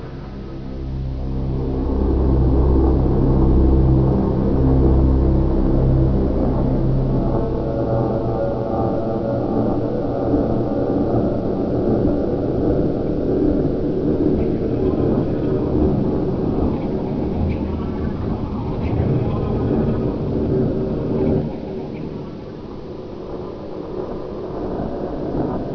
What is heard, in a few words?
strogg ship; quake2; kitsune